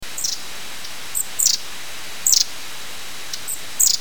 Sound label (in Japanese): enaga